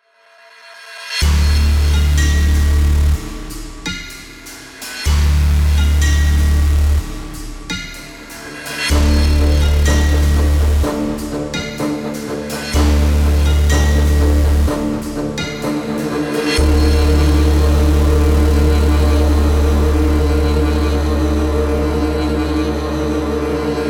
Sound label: Powerful guitar rock, Epic Strings; Halloween Adventure; No lead Epic Strings